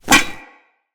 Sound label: Wall Grab Metal Foley; Tenno